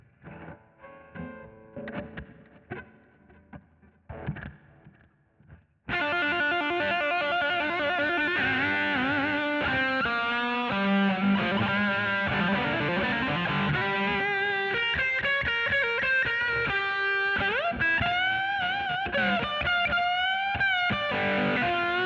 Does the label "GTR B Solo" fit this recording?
Yes